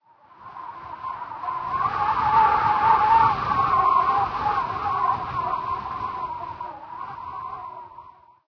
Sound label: wind snippet, ambient; Garry's Mod; hl2